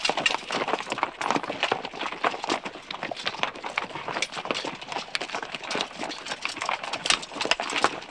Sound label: Cav Walk; Waterloo